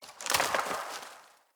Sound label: Wetland Forest Day Branch Break; Midrath Island; Ambience